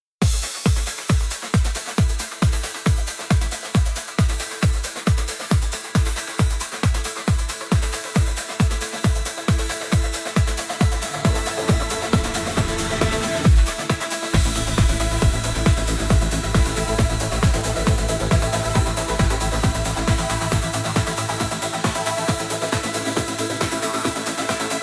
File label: Karaoke; try singing along